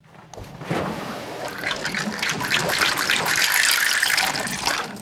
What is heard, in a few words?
water rowing machine slide and splash